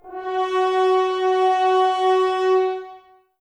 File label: F#, Brass, F.Horns, Woodwind Brass Ensemble; Sample CDs; Miroslav Vitous